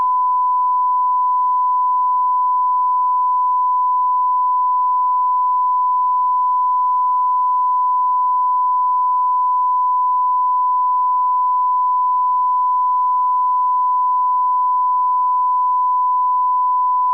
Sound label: SIGNAL 20kHz